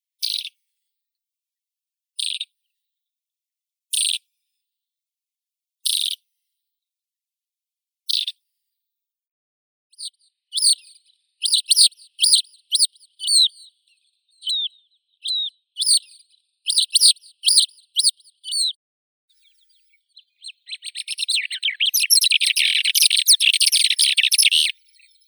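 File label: lesser short-toed lark, Calandrella rufescens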